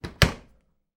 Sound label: household dishwasher door close; sound effects one